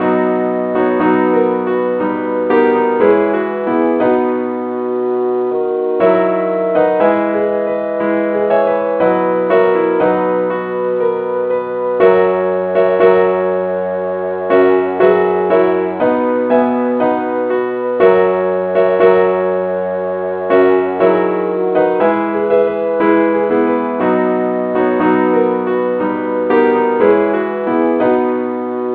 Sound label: keyboard key e